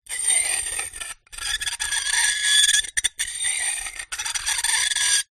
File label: glass shards x2 scrape together; foley